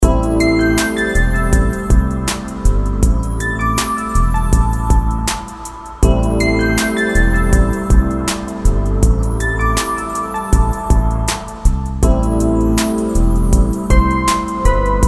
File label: Neutral And Lounge Loop